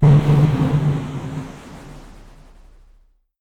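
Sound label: enemy attack boss appear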